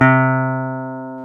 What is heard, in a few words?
Jazz Guitar; Club-50 - Foundations; Jaz-Kessel